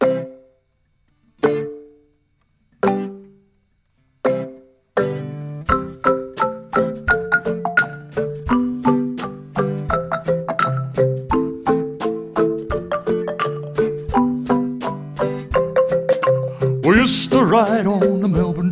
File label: Tram Song Vocal